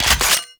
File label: Finisher Shotgun Pump; Symbilyst; Warframe; The New War; Kahl; Weapons